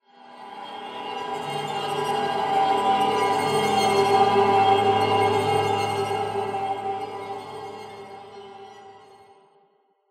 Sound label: High strings Sample